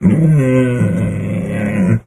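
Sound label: Tiger Growl Muted Yawn; big cats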